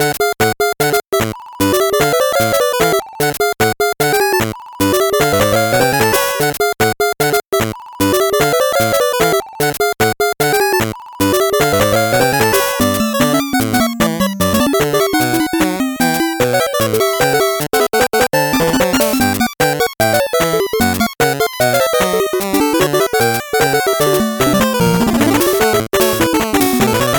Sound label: 8bit bgm fast